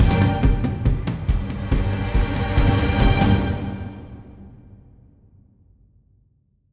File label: Combat Trans 140 Gm; Combat Basalt3 Trans1to2 140 Gm 44 Master